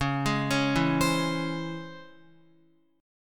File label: Db Minor Major 7th Db Guitar; DbmM7 arpeggiated; JGuitar